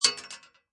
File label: Bullet Shell Drop Pistol Metal, empty Pistol shell falls on metal